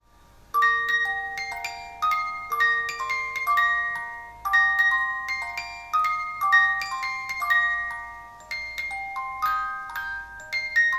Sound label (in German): Spieluhr; Au Clair De La Lune